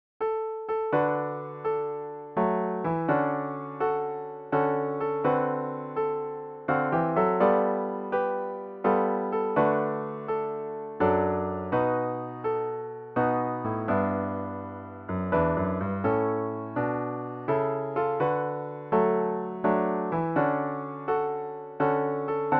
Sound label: Waltz; birthday